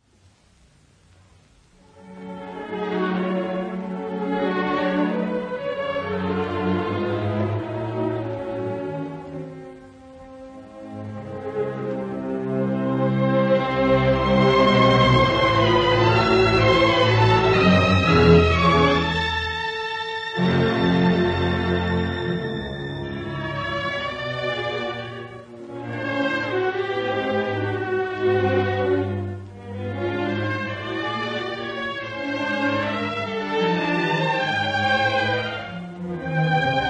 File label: String Quartet in F sharp minor, orch., Massig; Schoenberg; String Quartet No. 2; Op. 10